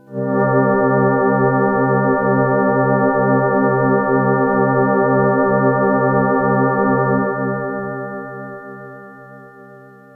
Synth, Soft FM Chord

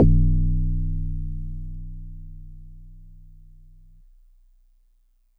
Marimba DX100 B0

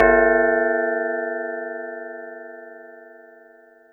Bell; EFM

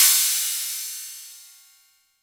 Cymbal

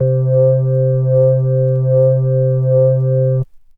Polysix Organ Vinyl Synths C2; vinyl keys

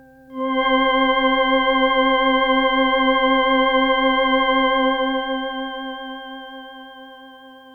Synth, Soft FM

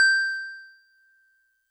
DigiPianet DX100 G6

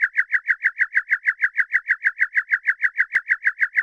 Chirp FX DX100 Cs3; birds

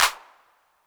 Clap A 808 Tape, Hand Clap, Clean

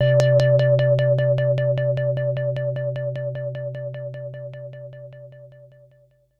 SYNTH Beauty Bellio Low

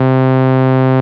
SYN Reeds

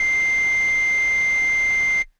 Air Bass Kawaii C6; keys

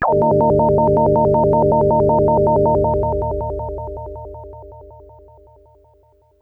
SYNTH UFO wavez Chord

